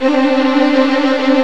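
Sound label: C3, major minor violin trill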